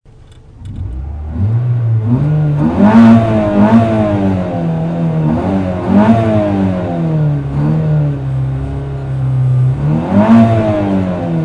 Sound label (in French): ralenti